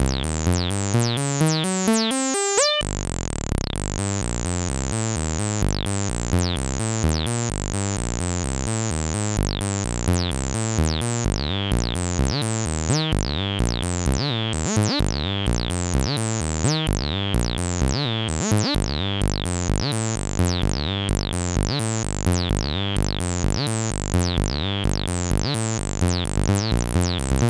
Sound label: saw